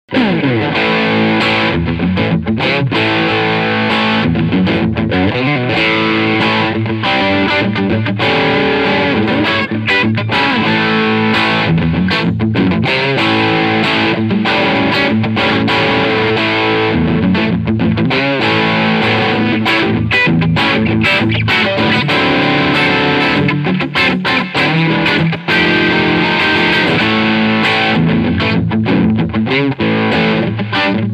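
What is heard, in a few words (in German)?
Tribute Doheny Surf Green E Gitarre Crunch beide Pickups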